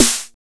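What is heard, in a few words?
909 LOOSE SN, TR909 snare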